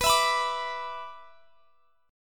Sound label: B-Minor Double Flat 5th; Bmbb5 strummed; JGuitar